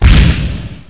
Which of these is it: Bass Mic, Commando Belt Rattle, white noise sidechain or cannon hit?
cannon hit